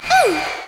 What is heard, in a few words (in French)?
houuuuu